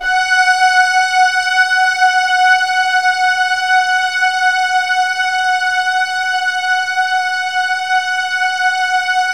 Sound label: Keypad; Standard Instr; Keypad 2